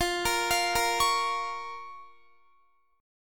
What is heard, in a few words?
F Suspended 4th Sharp 5th F, Guitar; Fsus4#5; arpeggiated